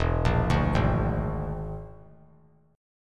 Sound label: E 7th Flat 5th, down; E7b5; arpeggiated